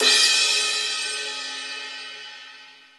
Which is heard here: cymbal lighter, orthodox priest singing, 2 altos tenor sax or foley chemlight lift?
cymbal lighter